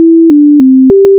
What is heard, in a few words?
logo tune